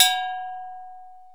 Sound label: PRC HI AGOG, Cowbells; Roland - Rhythm Section; Latin 1